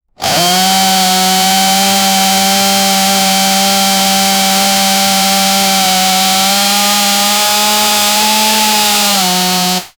big chainsaw

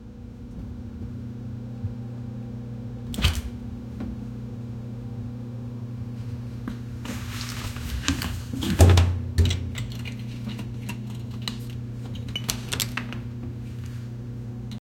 fridge door opens slight

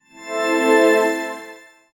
a triumphant yet soft sou, game effects

